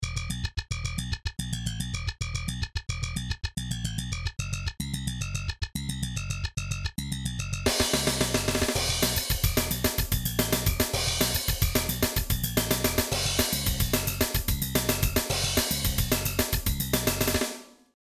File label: Bass Exercise; Bass Exercises; Slap Exercise